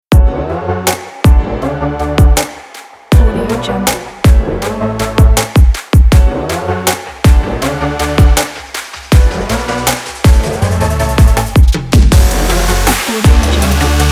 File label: a future bass